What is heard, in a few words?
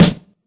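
classic hip hop quick snare; STYLE KITS (Hip Hop, Etc); Classic Hip Hop Mega Kit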